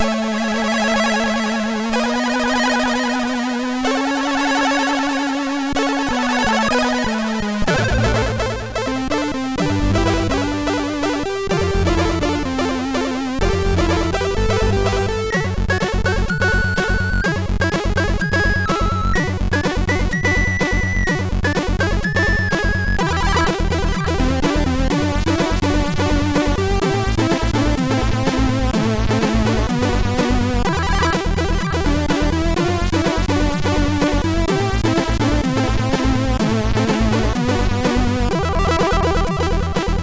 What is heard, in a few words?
Waveform test, MOS6581R4; hvsc